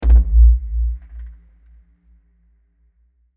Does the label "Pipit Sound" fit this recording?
No